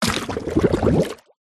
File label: nature water bubbles small item submerge sink